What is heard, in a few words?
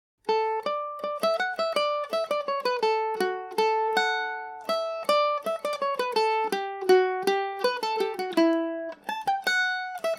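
D major, Irish mandolin; lord inchiquin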